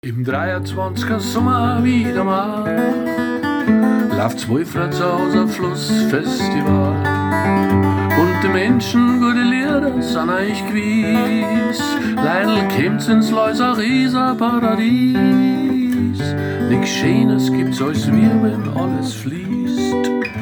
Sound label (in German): Erkennungsmelodie; Stadt Wolfratshausen; Fluss Festival 2023